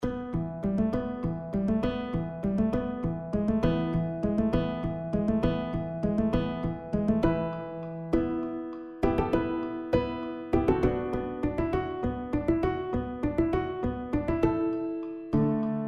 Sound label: Theme for Guitar trio